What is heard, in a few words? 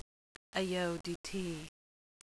wind